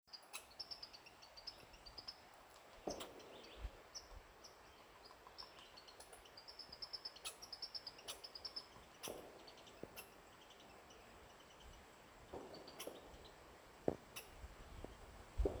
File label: Yellow-bellied Sapsucker, Sphyrapicus varius, Aves